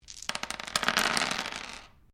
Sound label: Dice Mixed Laminate Table Throw; Variety of dice sounds; Casinos